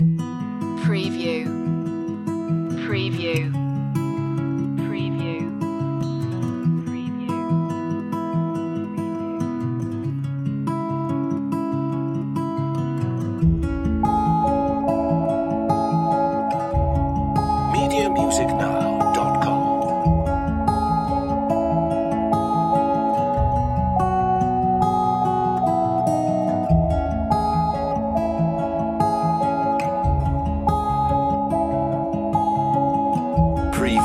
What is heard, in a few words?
loop